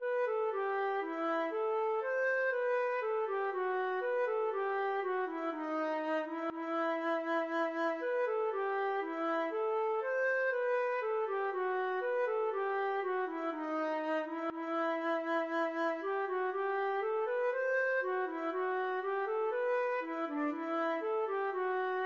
bourrée, feadóg; krieger

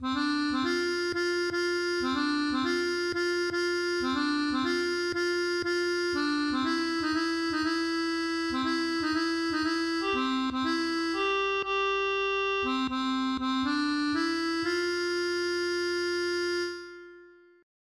boogie

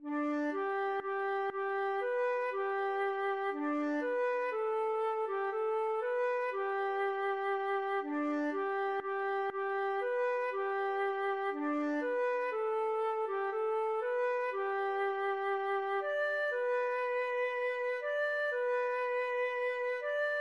kukit, feadóg

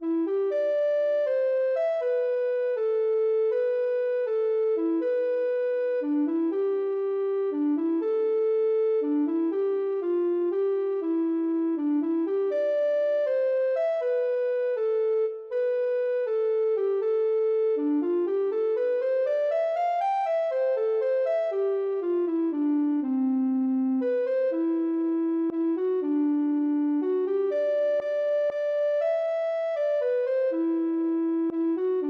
ocarina

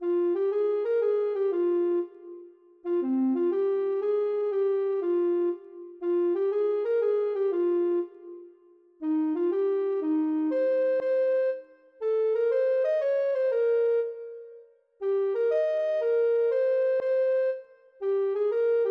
ocarina

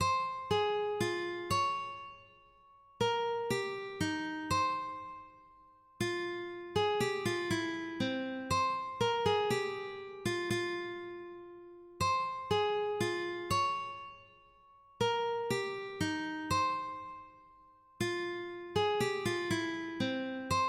krieger menuet, ukulele

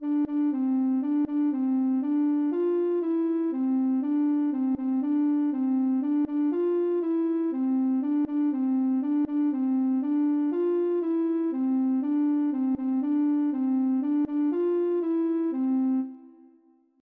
berale, ocarina

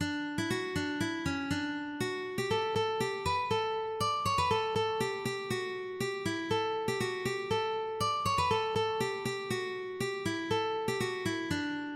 aviron, ukulele

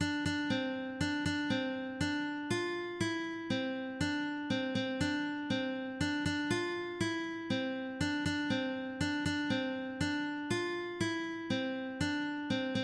berale, ukulele

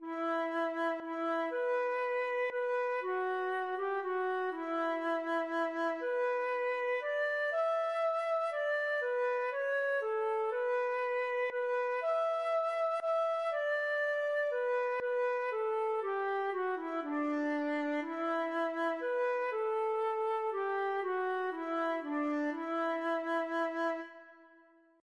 scarborough, feadóg

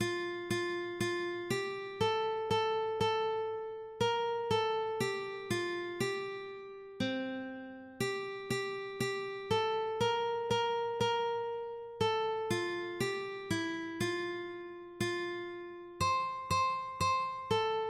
eser, ukulele

